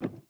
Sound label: reel Bounce